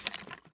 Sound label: Skeleton Attack; Playable Tutorial Level